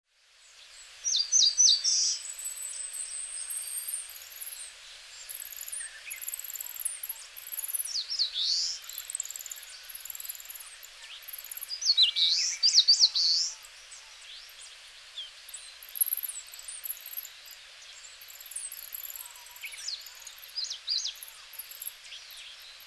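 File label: Eastern Crowned Warbler; hyogo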